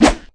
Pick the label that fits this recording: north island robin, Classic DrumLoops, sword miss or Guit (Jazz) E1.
sword miss